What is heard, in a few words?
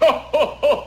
xmas slide